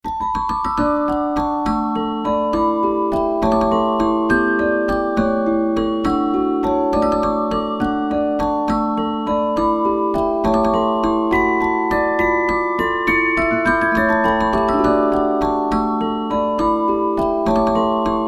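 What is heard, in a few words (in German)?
Blumenwalzer, music box; tschaikowski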